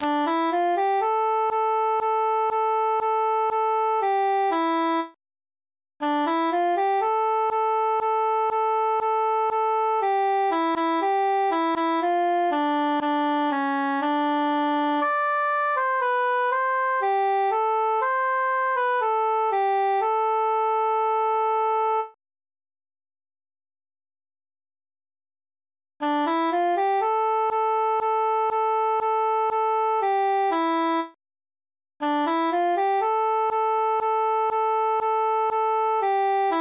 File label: cantus solo; il est bel et bon